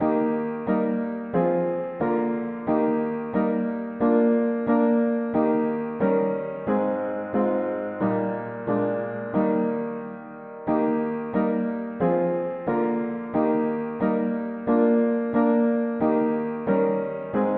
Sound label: mono piano; hymns; May the grace of Christ our Saviour